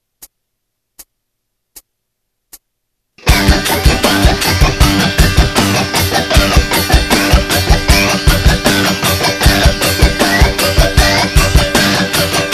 scratch, guitar

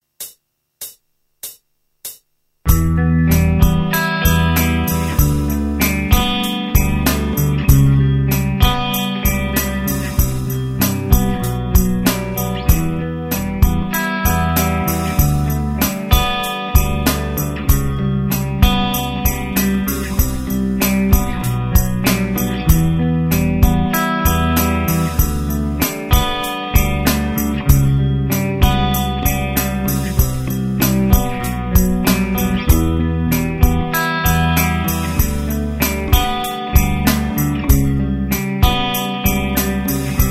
jam

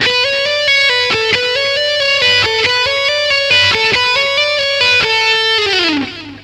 tap slomo

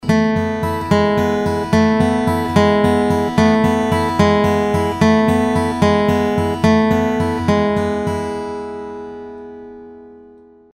crosspicking

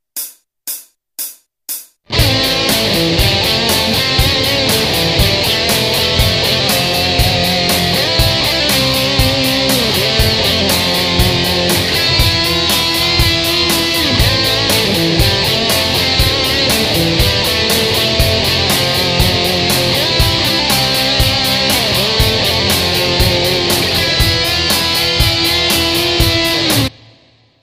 crab lead

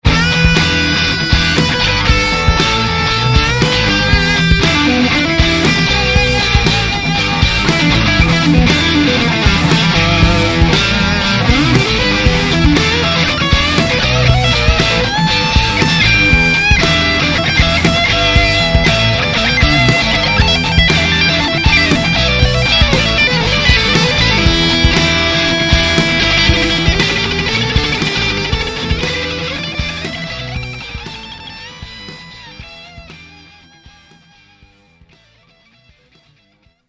silhouette neck crunch

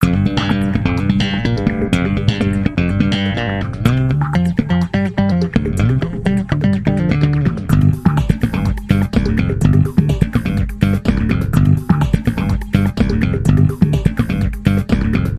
ampeg oktaver

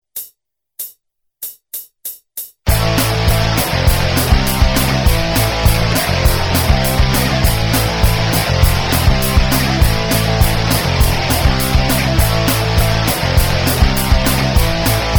jam

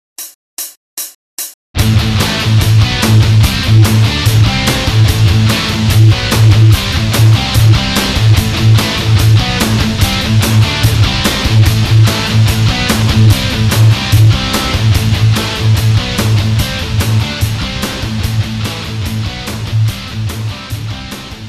metal, guitar

